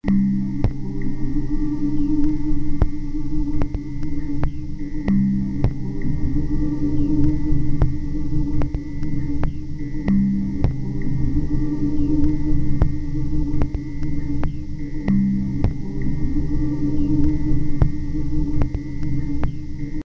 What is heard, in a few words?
minuet